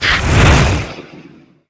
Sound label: missile; Falcon 40